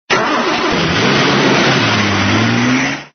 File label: Starting Car